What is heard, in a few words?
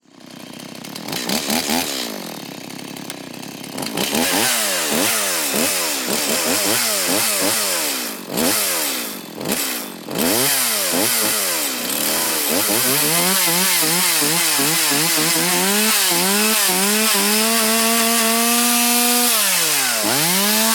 chainsaw gas small engine gas pressing and sawing high rpm mono; SoundHolder